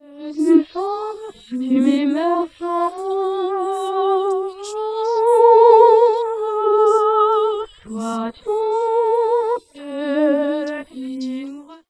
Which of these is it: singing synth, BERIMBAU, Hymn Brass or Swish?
singing synth